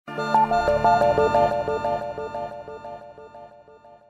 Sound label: message tone